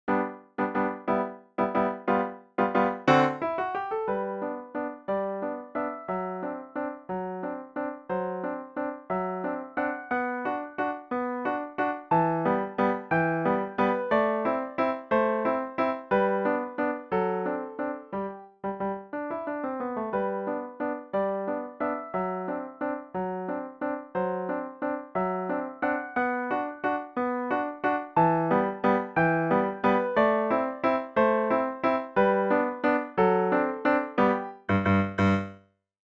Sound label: waltz piano; skaters